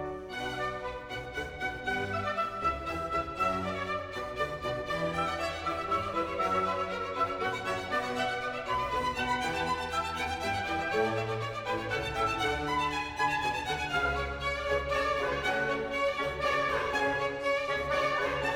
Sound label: Hornpipe, Haendel